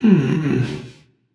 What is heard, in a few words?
sigh, voicepack; smite; jorm